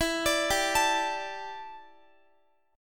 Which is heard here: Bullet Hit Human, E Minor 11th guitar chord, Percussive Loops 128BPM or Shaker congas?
E Minor 11th guitar chord